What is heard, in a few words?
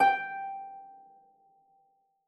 game show quiz bonus reveal